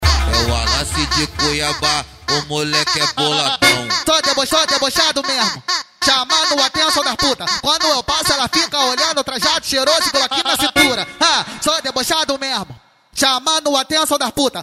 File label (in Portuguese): funk ritmado; especial cuiaba